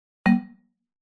stop button

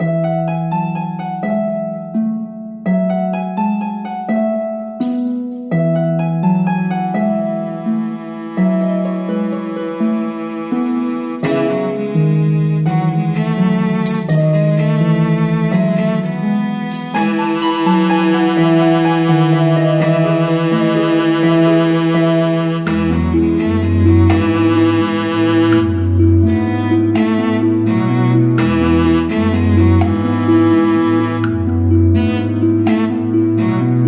Fairy tale music

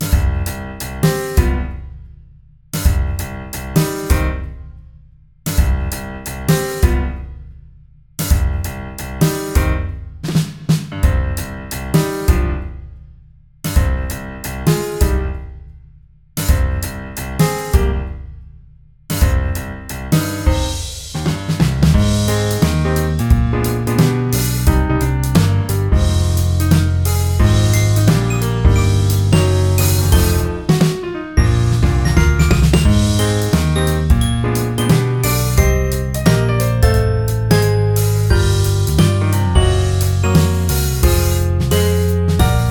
Suburban jazz cafe

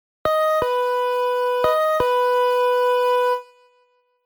Front door bell

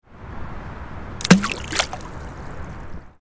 Throw stones at the pond

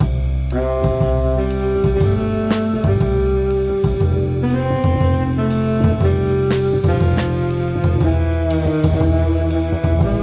slow time in rainny day

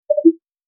pipo

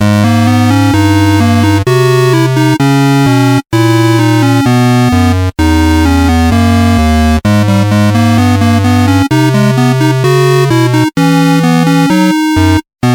Melancholy dusk

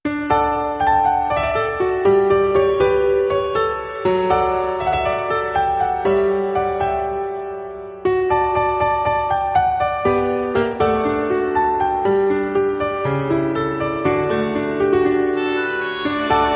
A bright piano piece that makes you feel happy

